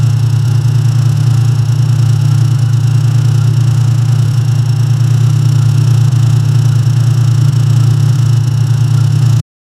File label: sports car rpm engine loo